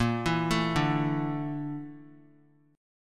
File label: Bb Major 7th Flat 5th Bb; BbM7b5 arpeggiated; JGuitar